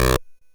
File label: Drums, Hits; DCM8